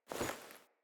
Foley Walk Default, Default Clothing; Player

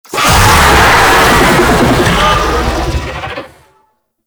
Infested Vocal Attack; Warframe

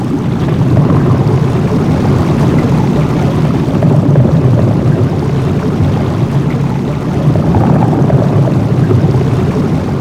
Galleon Water Tube; Warframe; Grineer Galleon

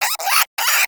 Cryptilex Vocal Alerted; Characters; Warframe; Hunting Critters

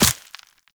Operator Walk Bone, Footsteps; Warframe

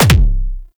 Pistol Punch; Weapons; Warframe; Tenno; Temple Signature

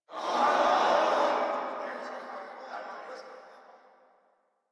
Crowd; Warframe; Rathuum; Stage Three; en

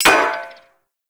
Flame Spear Mech; Warframe; Grineer

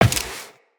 Step Glass, Footsteps, Walk; Tenno

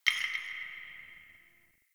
Interior Distant Marble Hit

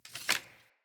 Bow Ranged Arrow Reload Grab; Weapons; Player; Hunter; Bows; EnvoyHunterBowRangedMelee

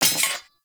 Pickup Sword; Warframe; Cephalon Weave

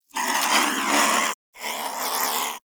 Vocal Snore, Critters; Characters; Warframe; Cryptilex; Hunting Critters

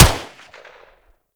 Pistol Shot E; Warframe; Tenno; Tenno Harlequin Pistol

